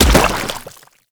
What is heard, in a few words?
Land Sludge, Footsteps; Warframe; Tenno